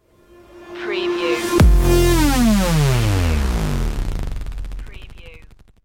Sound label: dutch influence stinger